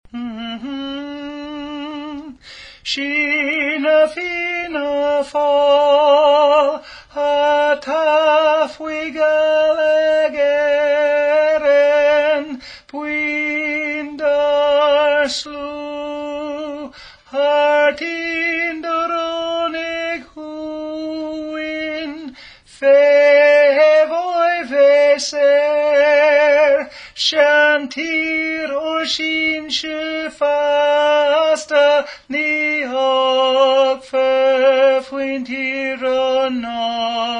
Gaelic Tenor Part; Soldier's Song

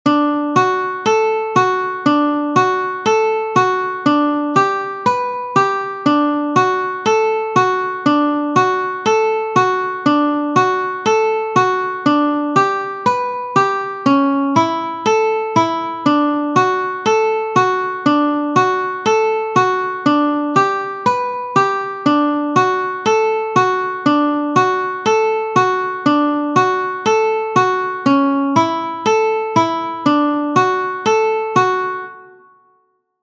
Slow Picking; Coulter's Candy

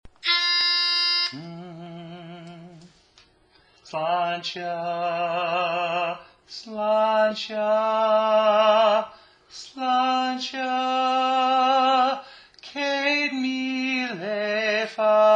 tenor

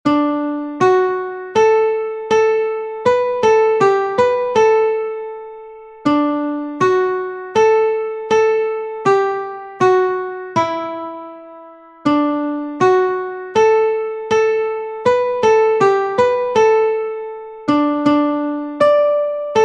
Slow; Coulter's Candy

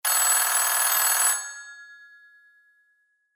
telephone payphone ringing

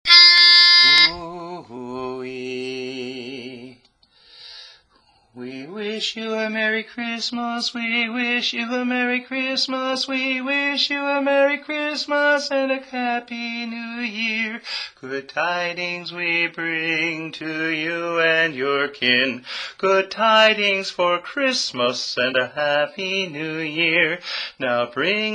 Tenor; We Wish You a Merry Christmas

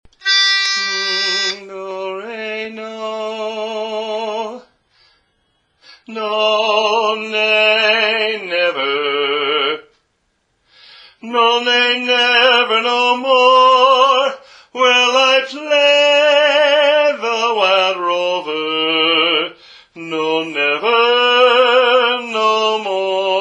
Wild Rover (Lead)

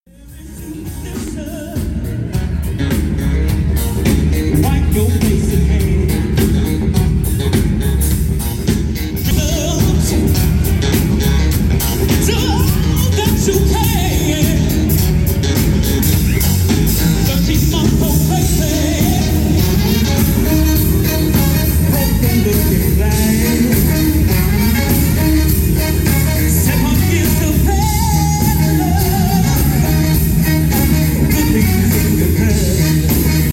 bass; Ray Chew

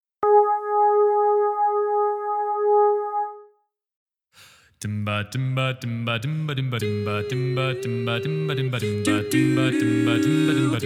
satb bass

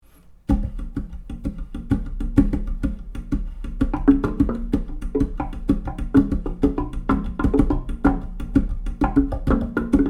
Theremin

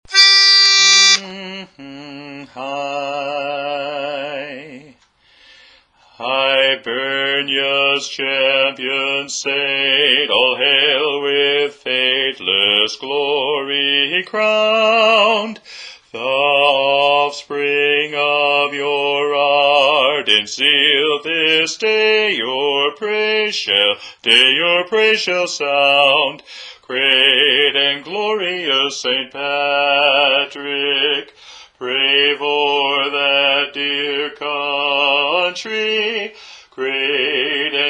Bass; Hibernia s Champion Saint, All Hail

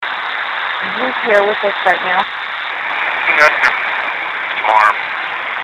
ghost box

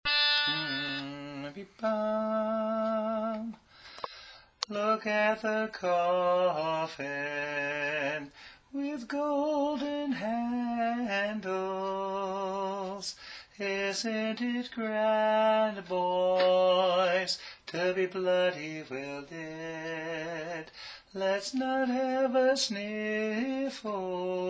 Tenor

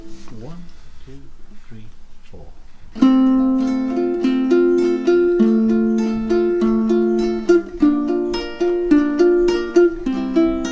Picking; In The Bleak Midwinter

